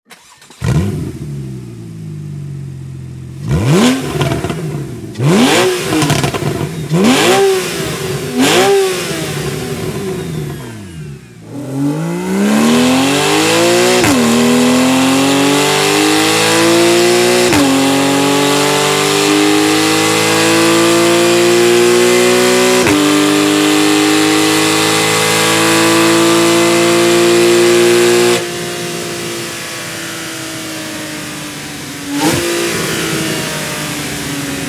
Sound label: Slip On closed valve Porsche 911 Carrera S